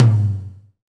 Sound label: TOM TOM, Roland